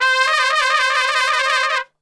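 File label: C trumpet shake; Best Service; Brass Super Section; TRP SHAKE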